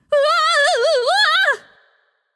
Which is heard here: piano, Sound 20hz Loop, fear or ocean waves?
fear